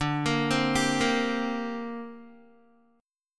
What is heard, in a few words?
D 7th Suspended 4th Sharp 5th down Guitar; D7sus4#5; arpeggiated